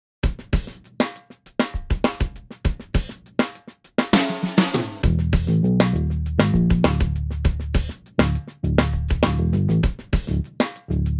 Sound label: Funk and Jazz Love in Em